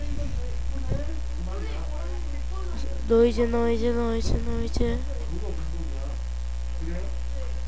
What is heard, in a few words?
noise